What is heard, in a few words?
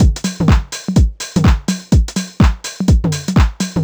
drum loop hurtme full 125, Classic Chicago House; Sample Magic; Drum Loops